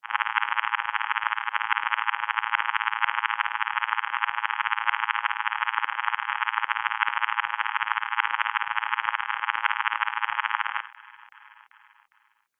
science fiction radio receiver transmission interference burst lost signal static